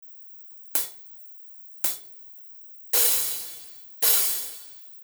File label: hihat